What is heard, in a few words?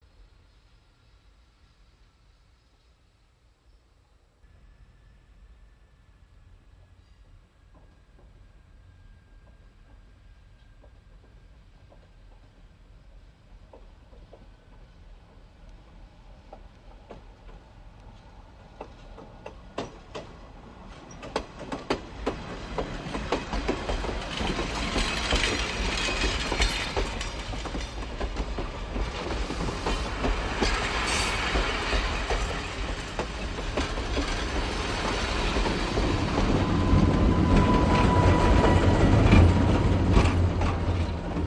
Diesel Train Backs Up, Rail Point of View